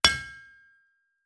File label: forging metal on anvil